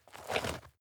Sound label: step dirt heavy boots scuff short; menschen; schritte